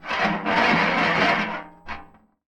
Tin Tray, Marbles, contact mic

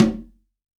CONGA, STEREO CONGA

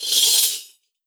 tamb, percussion; Best Service ProSamples vol.52 - World Instruments 2; MIXED PERCUSSION

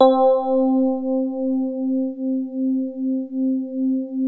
DX7 MID1 C4, E-PIANO; Music Store

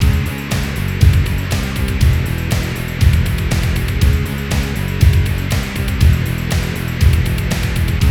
FAT ROCK

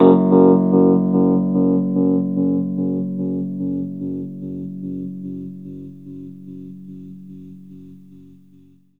Rhodes octave F#, R; 3D Sound; 3D Keys VOL-1

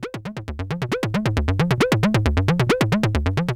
ARP, House arp loops; Best Service ProSamples vol.10 - House; AKAI

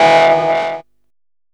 DR. LOOP, TRANCE; X-Static Goldmine 3

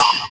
Trap Kick; Sonic Foundry (Sony Creative Software); Esoterik Beatz; Trap Kit

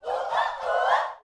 SHOUTS, Gospel

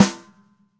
Ludwig Black Beauty Snare FF; Sonic Foundry; Sony Creative Software; Vital Drums; 1969; Snare One-Shots

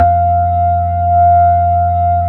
B-3, Hollow

